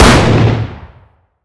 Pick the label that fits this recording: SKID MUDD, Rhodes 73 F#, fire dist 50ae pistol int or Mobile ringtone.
fire dist 50ae pistol int